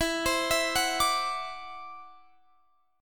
E 7th Suspended 2nd Sharp 5th E down Guitar Drop D